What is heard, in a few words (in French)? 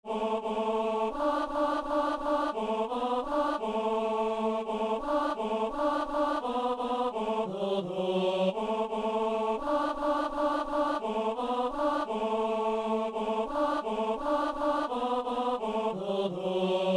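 ténor; Messe de Saint-Boniface - Alleluia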